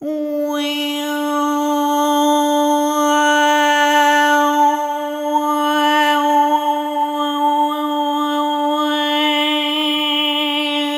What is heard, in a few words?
Arrhythmic D; Sonic Foundry (Sony Creative Software); ILONA